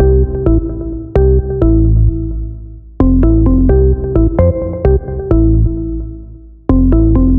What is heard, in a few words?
Organ